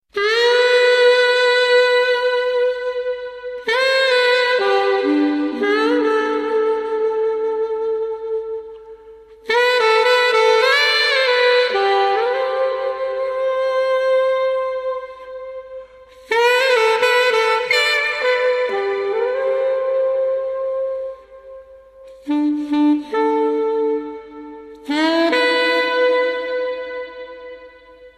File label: Saxophone